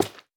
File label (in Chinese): 珊瑚挖掘声